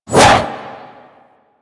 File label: Arch Wing Sword Short Whoosh; Warframe; Weapons